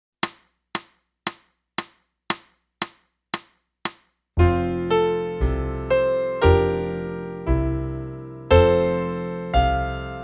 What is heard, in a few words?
sax